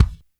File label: HIT, KICK DRUM; Sampleheads; New York City Drumworks VOL-1